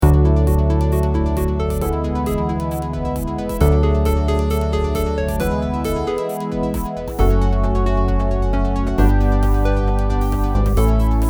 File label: dance